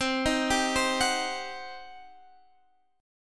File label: G Major 7th Suspended 4th Sharp 5th, C